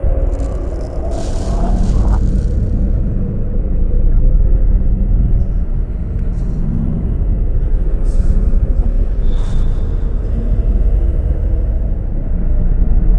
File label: Upper Level Tar Soldiers Ambience